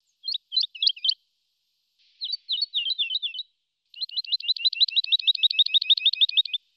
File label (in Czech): Skřivan lesní Lullula arborea